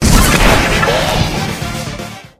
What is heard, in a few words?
RATTLE ME BONES; magic